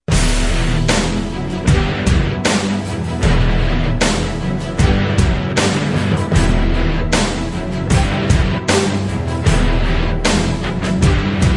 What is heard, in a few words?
Without Choir